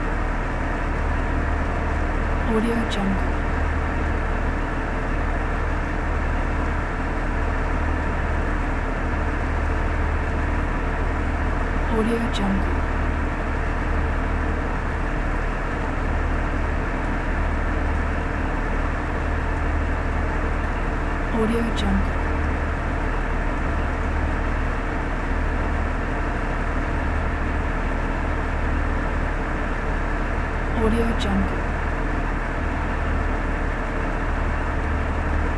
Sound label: harbor ship engine